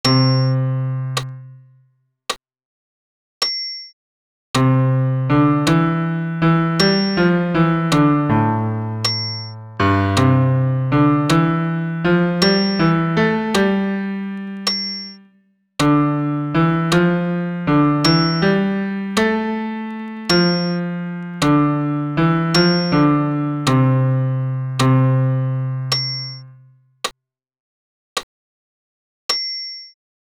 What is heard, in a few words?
Kyrie bas pian seul